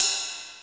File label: SY35, Yamaha Sy35, Drums